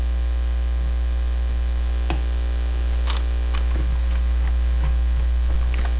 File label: bell